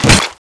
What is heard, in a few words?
slash